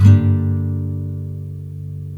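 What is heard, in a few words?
NYLON, G MINOR DOWN, CHORDS; Spectrasonics; Hans Zimmer Guitars VOL-1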